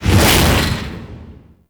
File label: Heavy Hammer Whoosh; Warframe; Duviri; Dax; Weapons